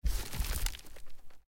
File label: Fresco; Blind Quest - The Frost Demon